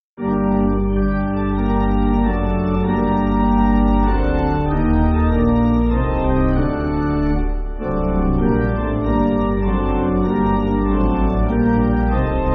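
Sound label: Pipe Organ, church backing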